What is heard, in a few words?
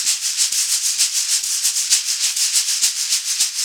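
perc african shaker, shaker loops; Roland LCDP14 Africa VOL-2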